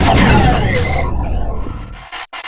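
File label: hover death; lmctf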